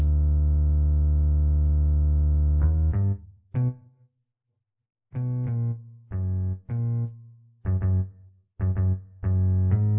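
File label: with drums, instantaneous mixture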